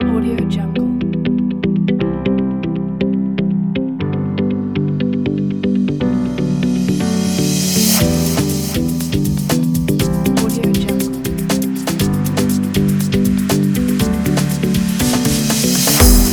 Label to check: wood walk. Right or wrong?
Wrong